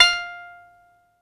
banjo, BANJO+FIDDLE; Best Service ProSamples vol.55 - Retro Sampler; AKAI

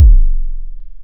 BD, Dance Mega Drums, HIP

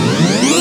ESCALAT

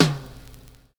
OLD LP, Kitch 70s; Univers Sons

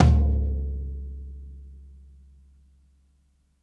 ROOMY FT MED, DRUMS ONE SHOTS; BASEMENT DRUMS VOL 2

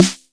Main Room Snare; essential drumkit

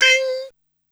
"Tinn", Korg Wavestation-SR single hits; Waves 456-484

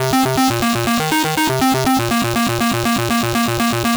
naggin arp C 121, synth loop; Zero-G; Soundclash; Nu Dance Electro

